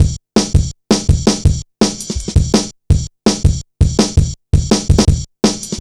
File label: heavy ridez, drum loops; Zero-G Jungle Warfare 1, 2 & 3